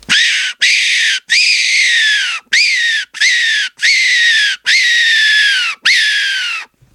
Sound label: T2 Black Main, 3D printed fox caller